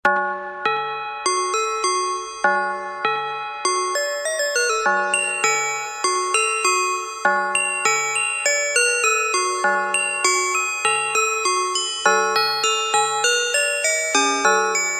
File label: instant gamelan